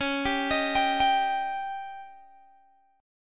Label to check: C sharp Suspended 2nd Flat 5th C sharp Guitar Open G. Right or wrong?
Right